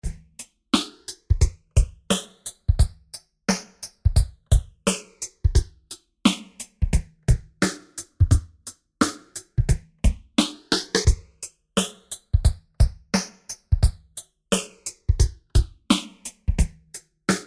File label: Drums; MAX100